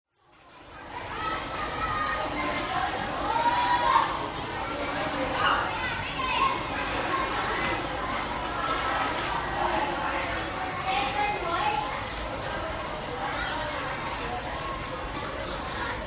public places school playground busy kids children walla vietnam